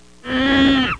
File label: Single chimpanzee growl